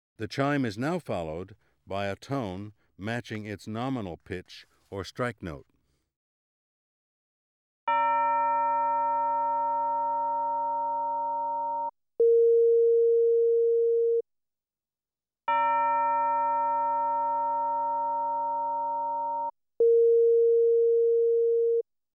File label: Strike Note Of A Chime; ASA auditory demonstrations